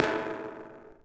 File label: tile; ambient; Shadow Man